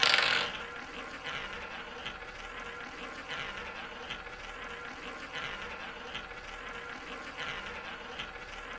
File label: ball start; americanroulette3